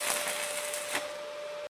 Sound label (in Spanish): click Aceros